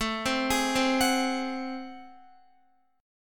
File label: A Minor Major 13th, down, Guitar; arpeggiated; AmM13; JGuitar